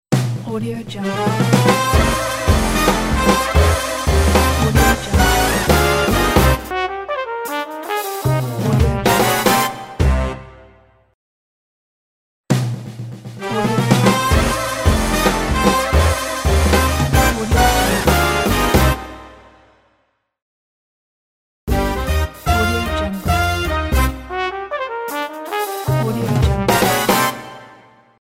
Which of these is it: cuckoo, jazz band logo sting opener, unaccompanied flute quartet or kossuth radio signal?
jazz band logo sting opener